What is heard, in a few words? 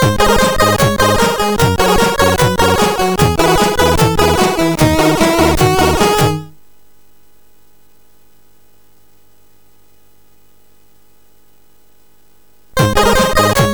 Aplause, sid, MOS6581R4